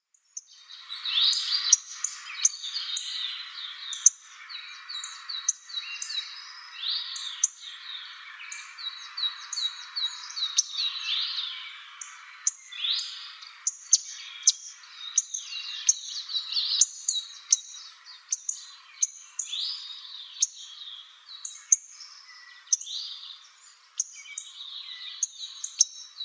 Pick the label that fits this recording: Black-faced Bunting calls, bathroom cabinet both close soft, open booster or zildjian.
Black-faced Bunting calls